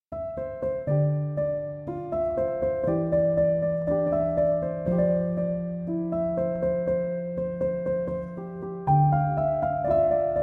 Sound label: piano instrumental